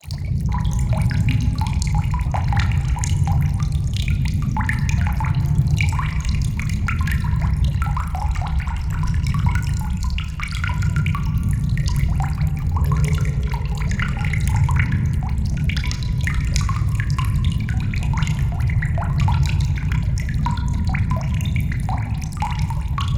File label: ambience cave trickle; water; Bluezone Corporation